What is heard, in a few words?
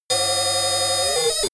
alarming computer ringing